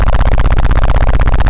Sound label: helicopter loop; movement